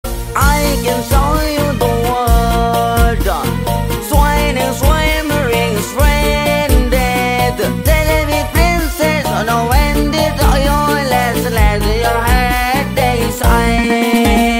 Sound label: ARABIC SONG